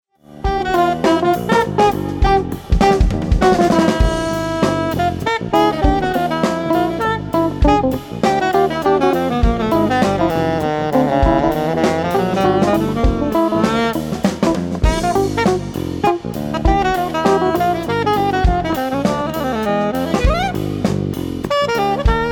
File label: Eb Half Curved Alto Soprano Saxophone